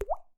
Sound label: Mouthdrop, Convolution, IR Samples; Unusual